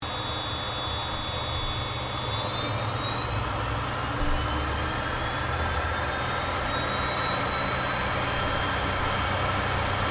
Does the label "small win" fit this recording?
No